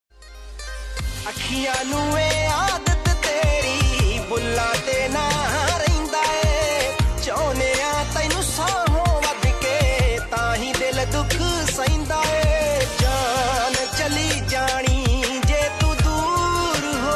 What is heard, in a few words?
Punjabi Sad Ringtone